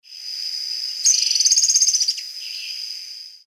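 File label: Pogonotriccus eximius; Ocampos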